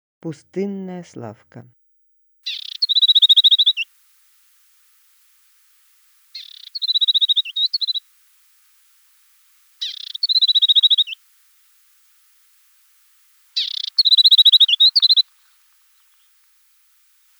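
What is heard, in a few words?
pustynnaya slavka golos, desert warbler voice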